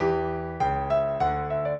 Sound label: minuet, piano; Mozart